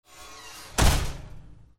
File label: KITCHEN